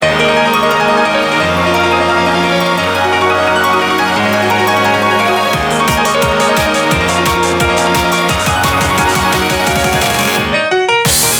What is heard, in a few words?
Bgm Reach